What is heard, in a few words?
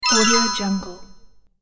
shimmer